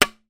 impacts plastic thin cover

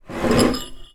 household bowl with spoon in slide on table

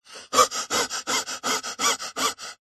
human male fast breathing panting panic

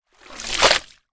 towel pull out of water down on ground; foley; zapsplat

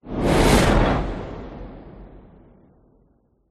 whoosh; orlando lanzini

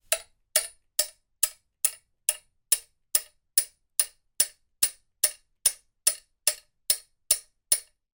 industrial metal hammer impacts on metal pipe end

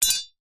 knife set down concrete

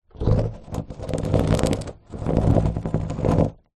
sport surfboard longboard movement on hard ground

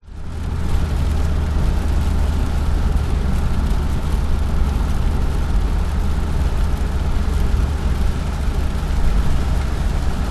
car drive fast motorway rain windows open; transport

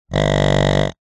pig grunt long designed; zapsplat

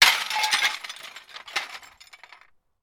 impact tiles glass debris; sound effects one

